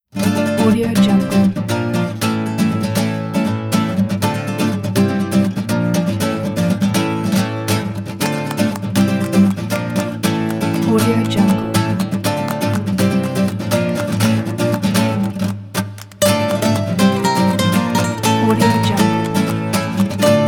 latin guitar

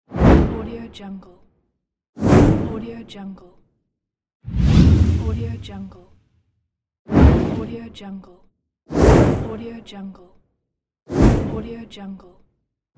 swooshes

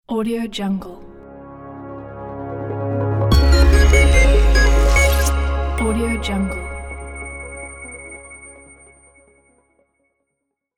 optimistic positive logo

